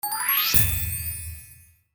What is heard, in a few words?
super buzz click